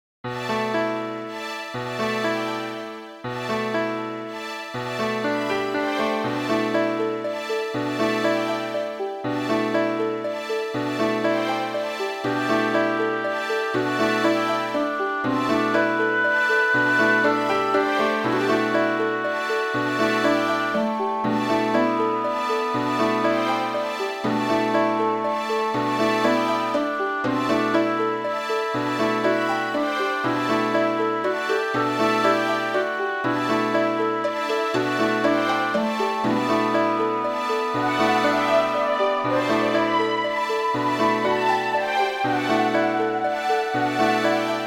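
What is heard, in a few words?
church background music; heroes quest android game music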